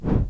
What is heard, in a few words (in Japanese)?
足音; LcdDem SFX